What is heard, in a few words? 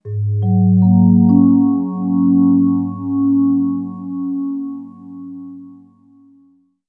Vibe, Vibes; Sonic Foundry (Sony Creative Software); James Johnson Spektral Minimalism; Sublime Keys